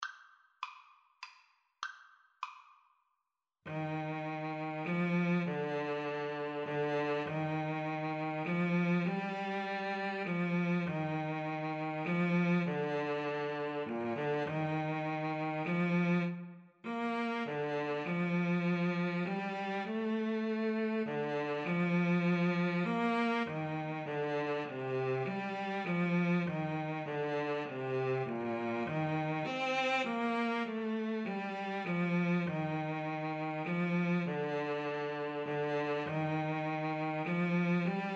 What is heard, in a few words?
jupiter, Flute Cello Duet; holst